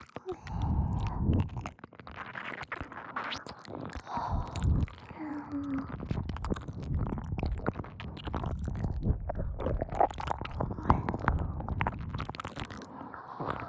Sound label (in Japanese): 耳舐め